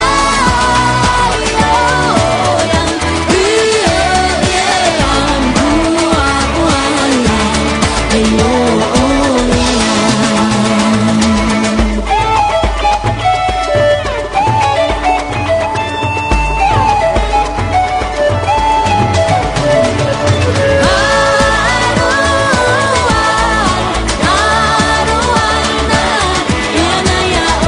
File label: cool taiwanese ringtone